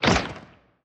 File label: death spawn prep